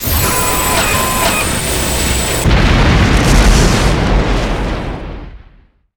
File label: miss, combat, suit